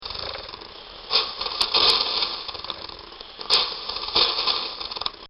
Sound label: Coffee Brewer